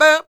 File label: FEM PERC, Vocal Jazz FX